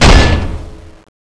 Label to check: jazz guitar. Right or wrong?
Wrong